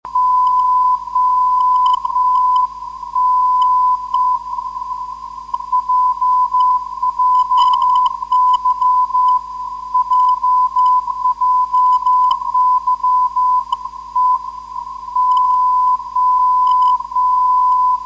40 kHz

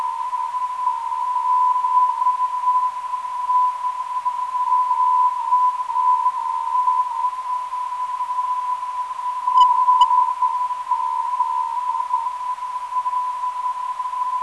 474.95kHz, ndb

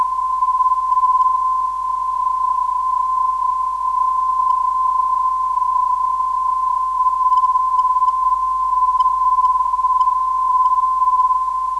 351kHz, ndb; BOD

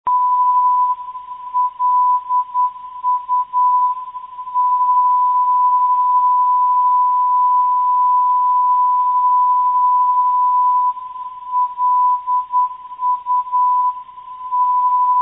397khz, ndb

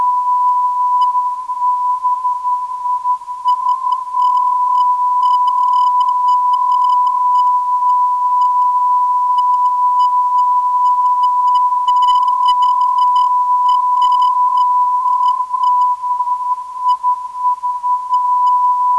283kHz, ndb; BIS

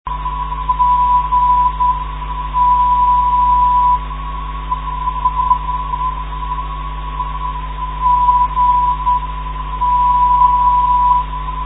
g 336khz, ndb

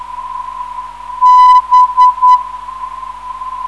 438kHz, ndb

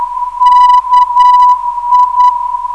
311kHz, NDB

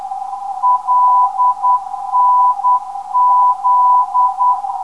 LNZ 325kHz

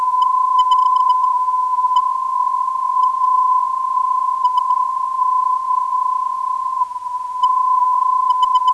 366kHz, ndb

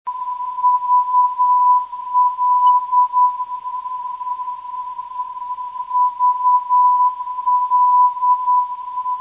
320khz, ndb

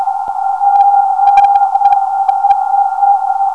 345kHz, NDB

